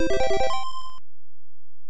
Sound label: COIN; Life Force; nes